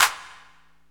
long room reverb clap sound c key, percussion single hits one shots